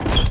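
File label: door heavy locked; The Elder Scrolls III - Morrowind; Morrowind GOTY GOG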